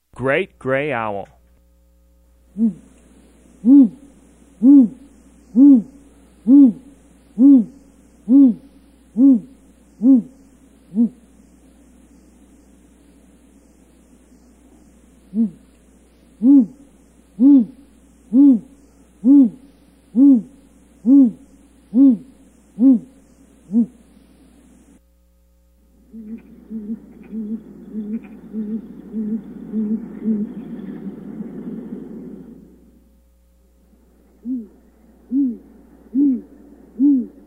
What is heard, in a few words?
Great Gray Owl